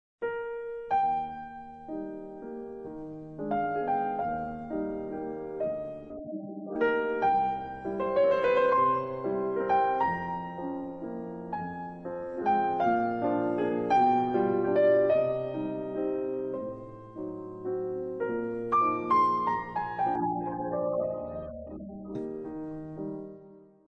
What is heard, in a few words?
ORCHESTRAL